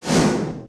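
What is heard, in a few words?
Collision Interior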